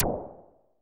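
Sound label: Nord noise, techno; Drums In Your FACE!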